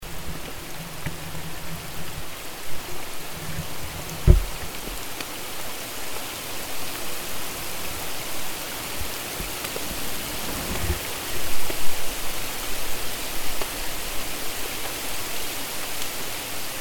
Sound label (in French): pluie averse